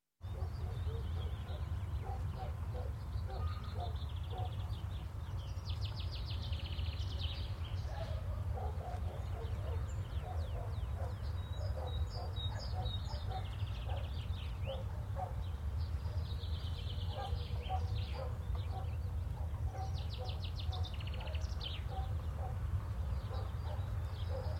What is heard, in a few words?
Residential Ambience; Sound Ideas; Series 2000